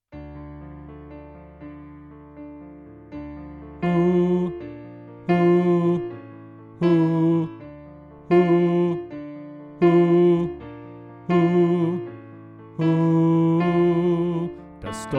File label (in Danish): Sopran; oevefiler